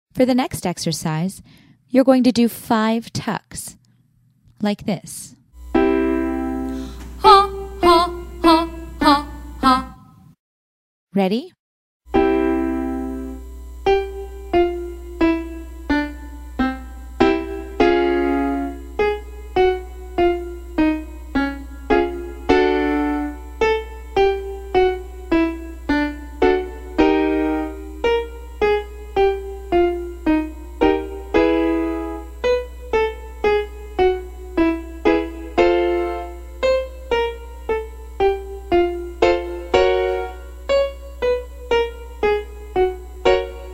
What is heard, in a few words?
5 Tuck